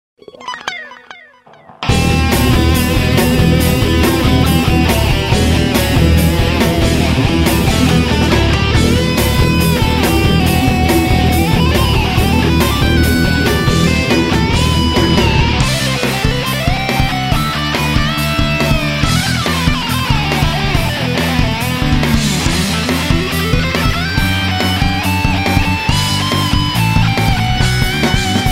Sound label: Classic Metal